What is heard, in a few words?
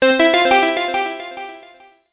School Pleasant